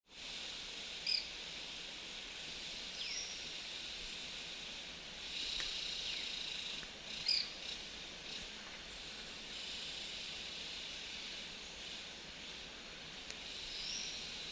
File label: White-bearded Manakin